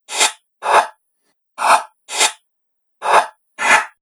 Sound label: Sharp Chopper Loop, machinery; Looping; Fantasy, Horror And Sci-Fi Games; Andrew Fleming